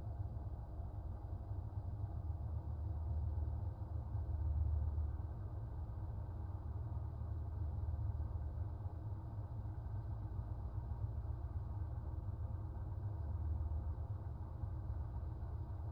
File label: Ferry Shudder